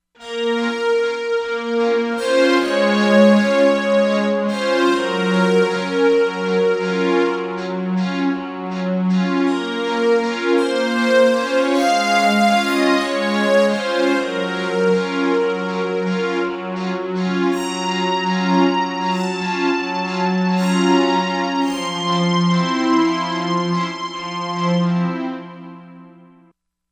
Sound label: SynQuartet, Korg MSC-07S Synth; Korg MSC-07S Synth 2